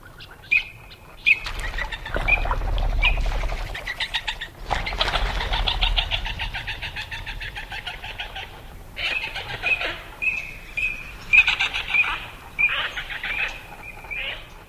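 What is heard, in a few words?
courtship flight, green-winged teal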